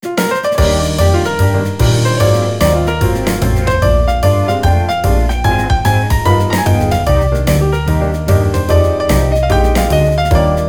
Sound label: Quartet; Sony Ericsson; PlusFourSix